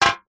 food drink soda can empty set down concrete ground